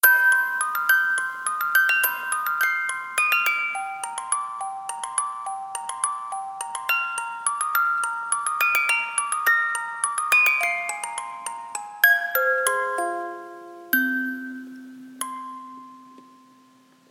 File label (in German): Spieluhr